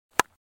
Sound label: knock